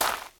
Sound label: gravel, step